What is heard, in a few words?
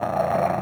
Up Dog Growl; A Robot Named Fight